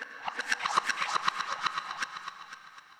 FX, ambient, 160; eJay

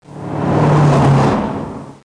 vehicles

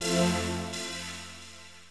SYNTHPAD, HOUSE, 125, A; Sphere

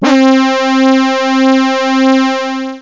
analog brass, synth brass; Octamed 6; walkabout

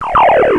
zaappp, electronic sounds; Sound Effects for Multimedia - Volume 1

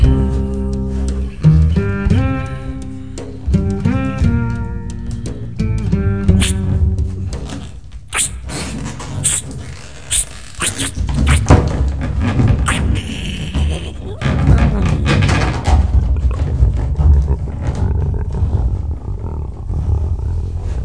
ROOF; Kunsthaus Tacheles

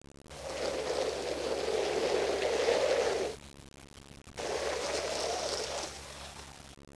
drag hose, fire; disaster

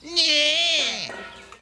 nyaaa; Fraggle Rock Season 1 Bonus CD

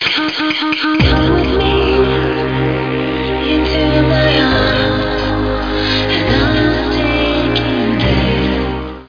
Electronica Asterisk

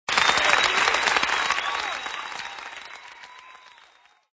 applause

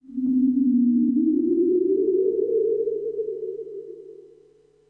ghost

